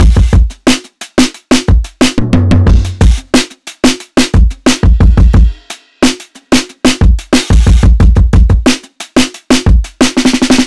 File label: Finger Drumming